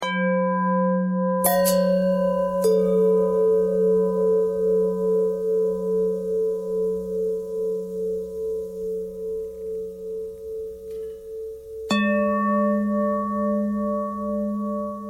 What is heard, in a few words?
long bell bell threads, bells